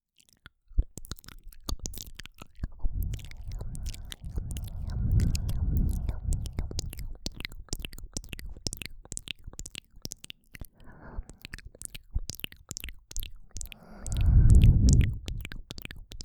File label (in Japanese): うるおいたっぷりのマウスサウンド